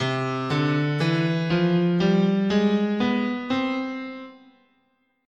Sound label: C Harmonic Major, scale